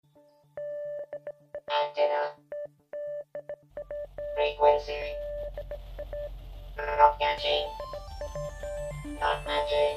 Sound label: Robot Frequency